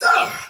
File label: Pain Soldier Sword Agent Male, Battle; Sinecure